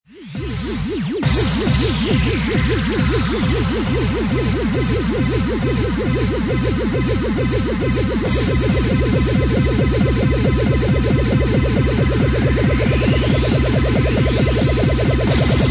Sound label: Bassline; Bassline Meltdown Session 1